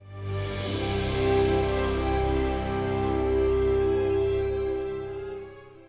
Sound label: Music - Stinger; Spider-Man 2: The Game